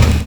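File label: OLD GROOVE; Univers Sons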